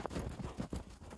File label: horseman walk; propack